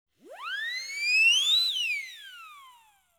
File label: Siren On Reverb; BBC Sound Effects Library